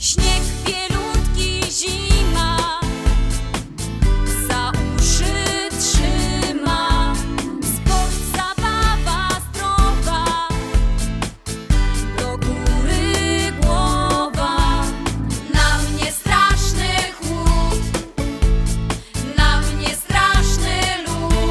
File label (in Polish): Dzwonek, szkola; Stramus